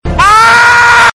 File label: Cry; torchlight; FFVII